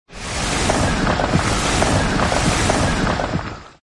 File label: skill bullet